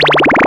Bubbly, Synth FX; musicradar; cartoon caper

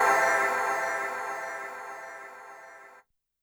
Vox Bell H, YAMAHA RM1X

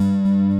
GTR BASS; Pro-Rec - Synthsonic; Z1 DANCE

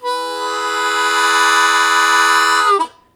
Blues Chord Attack E1, Harmonica; 90 s Sample CDs; Zero G

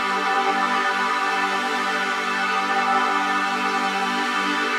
Pad Loop G, shimmer and sparkle

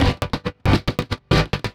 Grind A Mod; Sonic Foundry (Sony Creative Software); Hydroponic Hip-Hop

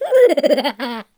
Mouth Fx, Vocal

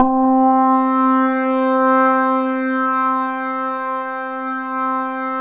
PHA, synthesizer bass; Synthesizers; Soli-Music; World of Synthesizers VOL-1

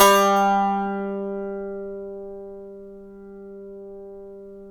SAZ; Roland - LA Composer vol. 3

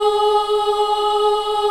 G#3 CHILD OH, Choir; USB Soundscan vol.28; Acoustic & Synth; AKAI

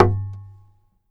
BODY, guitars; Spectrasonics; Hans Zimmer Guitars VOL-2; FNGSTYLE PFM

